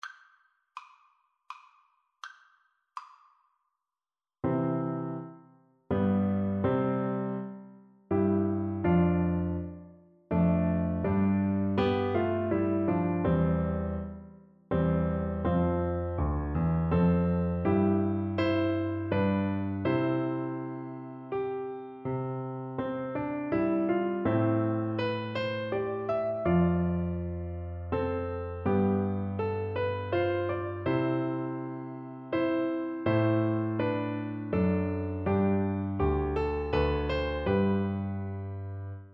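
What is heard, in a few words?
recorder karaoke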